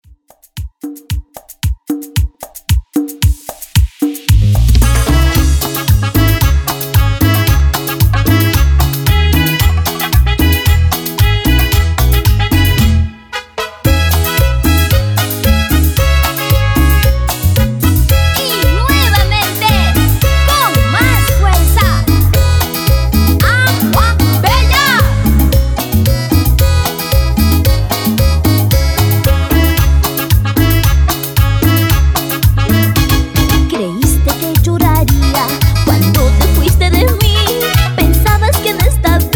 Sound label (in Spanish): Cumbia PERU